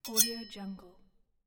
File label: small resonating metal scrape